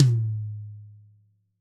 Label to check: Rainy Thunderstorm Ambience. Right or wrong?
Wrong